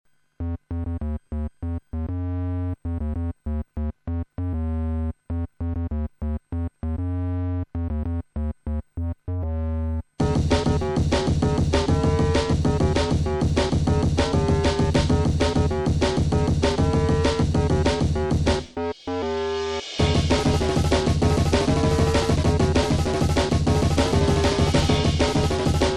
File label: DRUM SIDE, ELECTRIBE MX; TEK HARDTEK TRIBECORE